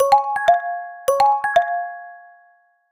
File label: Stones, Ringing Tones; HMD Barbie Phone US